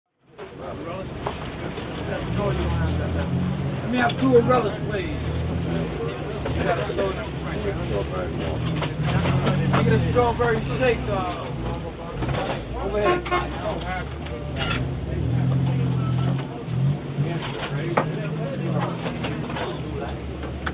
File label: RESTAURANT Background CHATTER: Deli restaurant, hectic, register, orders called, traffic.; restaurants & cafes